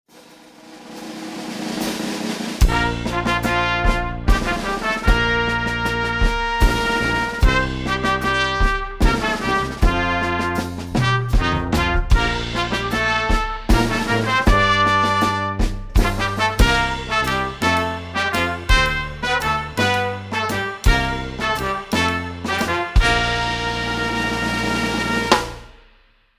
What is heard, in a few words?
Fanfare